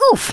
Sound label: oof, Rasta; Worms Armageddon